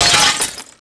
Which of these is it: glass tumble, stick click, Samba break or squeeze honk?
glass tumble